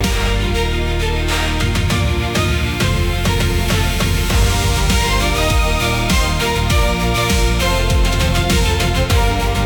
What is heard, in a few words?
loop; opening